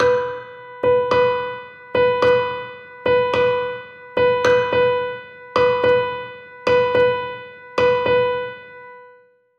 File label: Rhythm Exercise